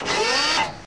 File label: hydraulic; gmod; lfs luna s flight school; laatc atte